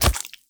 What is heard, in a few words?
Raw Meat Impact; Warframe; Awakening Remaster